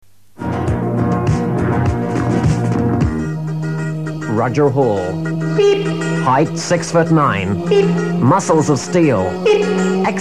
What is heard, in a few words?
jingle